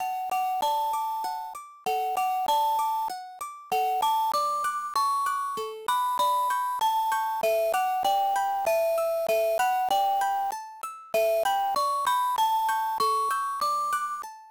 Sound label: BERCEUSE; my baby ds gamerip 2008